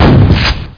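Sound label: shotgun; Quake; weapons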